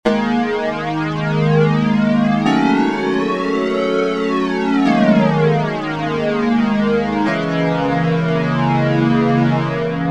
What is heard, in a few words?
m1000 dod r870